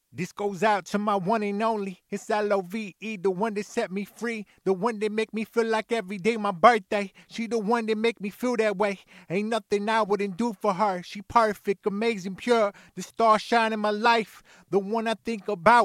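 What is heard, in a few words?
hip hop 85 Bm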